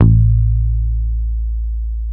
BAS; Best Service Gigapack 2

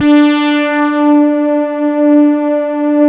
CHORUSED; E-MU Formula 4000 Series Vol. 2

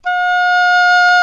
OBOE; Roland - LA Composer vol. 1

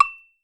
BZLN AGOG, Percussion; Voice Crystal - Steve Reid Definitive Percussion; BRAZIL SET

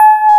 KEY EP1 SFT, EP + E.Grand; Roland L-CD701; Pop Pianos 2

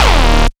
D Electro, bass

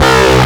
Synths Note C

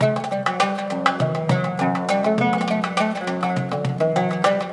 Morocco Ens, Loops & Phrases; Roland BK-5

